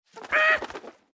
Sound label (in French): Poule